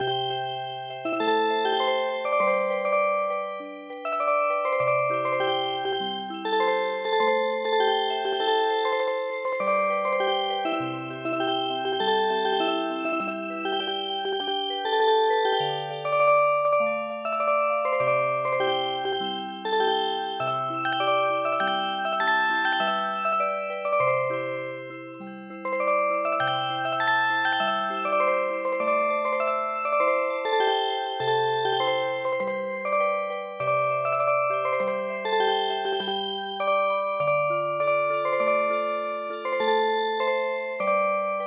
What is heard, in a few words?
Music Box, traditional